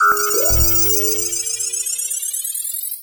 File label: Sci-Fi Power Up